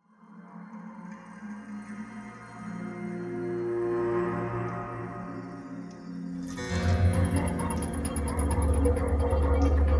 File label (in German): score fx bruecke; ueberschall testbericht